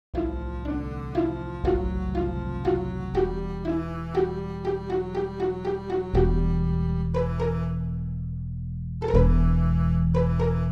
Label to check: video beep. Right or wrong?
Wrong